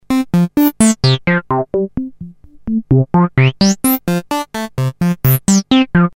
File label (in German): Transistor-Kaskaden-VCF, Normale Einstellung, Eingang Saegezahn, Modulationsquelle: LFO; modular synth